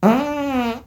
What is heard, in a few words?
farts